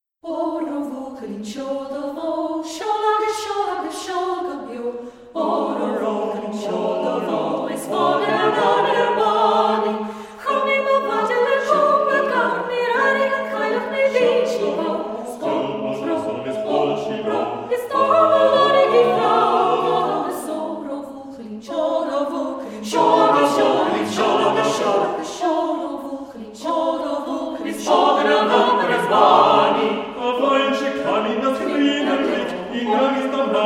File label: Oro Bhuachaillin seol do Bho SATB